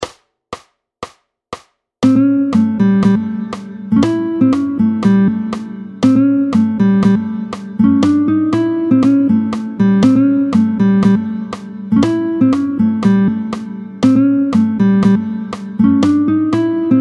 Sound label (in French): Les deux tierces. Vite, tempo 120